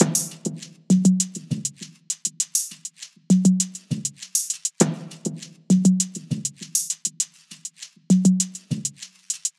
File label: Percussion Loop 100 BPM; Racer; Hip Hop